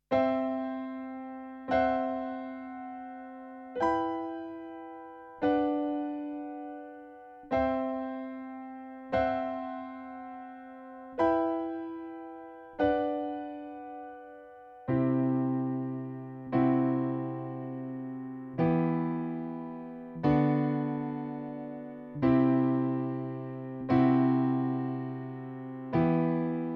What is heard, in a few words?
Piano